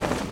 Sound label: crawl